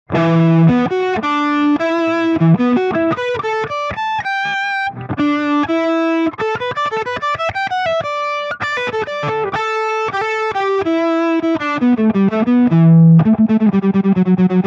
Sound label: ADSR attack soften; various presets tricks